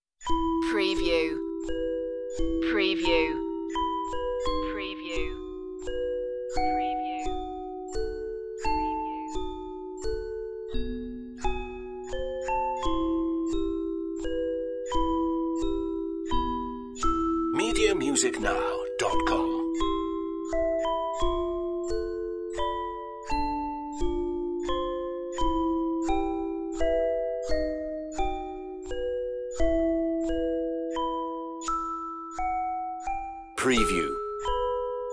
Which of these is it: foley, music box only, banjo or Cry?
music box only